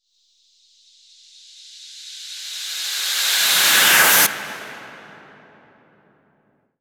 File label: FX Uplifter